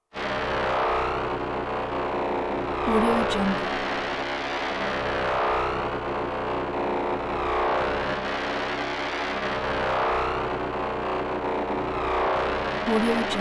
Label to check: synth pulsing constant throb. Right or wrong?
Right